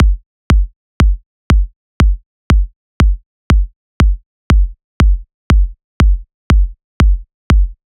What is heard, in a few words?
Kick loop, EDM 120 bpm; Future House; Drop; Ableton Projects; Big EDM 120 bpm (Drop) Project